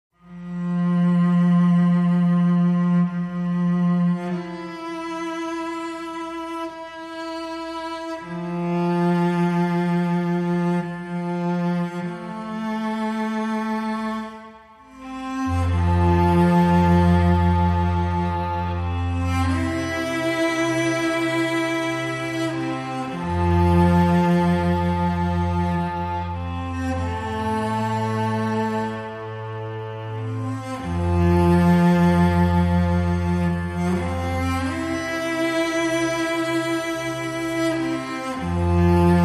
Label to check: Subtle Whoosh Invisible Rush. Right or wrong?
Wrong